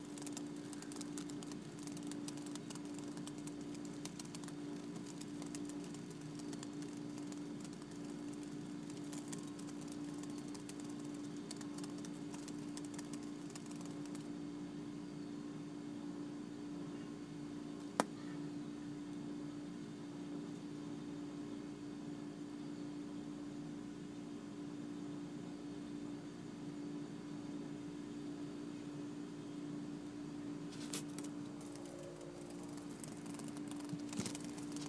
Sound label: window box moths